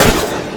Crash

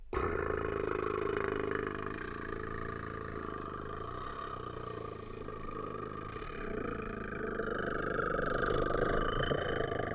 Construction

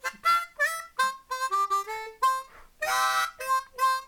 Harp

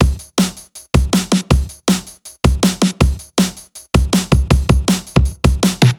Drum n bass Drum loop 160 Bpm

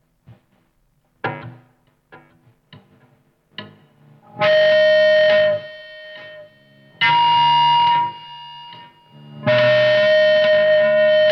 Guitar Feedback

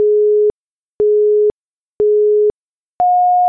Short Beep Countdown

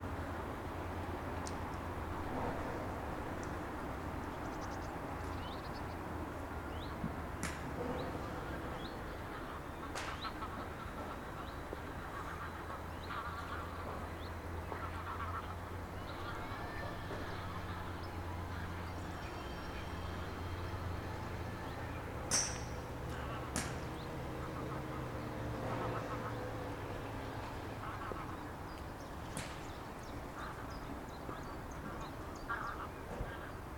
Golf Driving Range

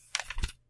Page Turn

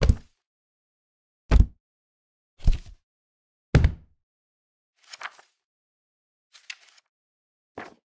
Heavy Book

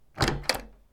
Door

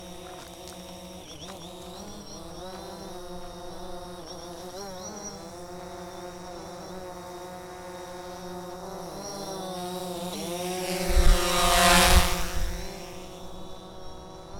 Drone Pass

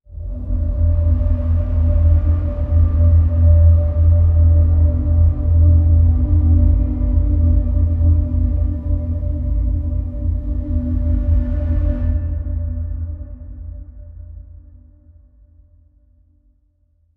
Ambient Wave Marker

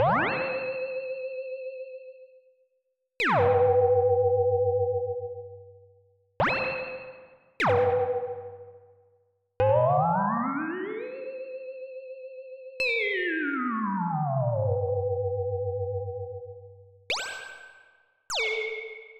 Magical Sound Effects

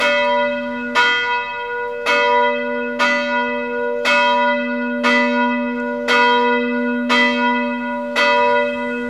Single Bell Campanile Loop

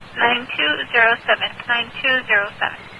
Police Fire Ems Random

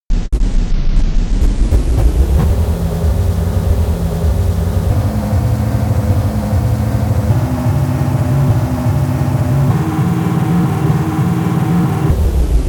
Engine

